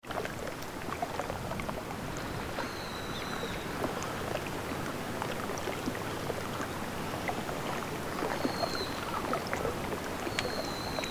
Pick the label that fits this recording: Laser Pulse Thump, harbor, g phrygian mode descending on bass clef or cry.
harbor